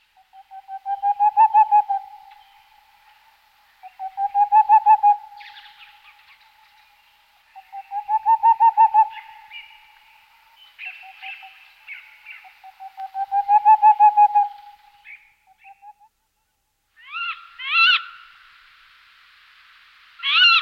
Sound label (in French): chouette de tengmalm chants et cris